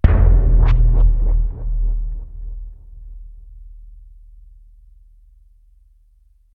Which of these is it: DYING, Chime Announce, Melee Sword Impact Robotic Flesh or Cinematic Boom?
Cinematic Boom